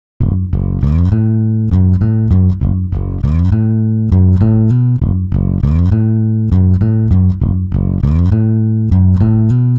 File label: pitchshift bass; celemony melodyne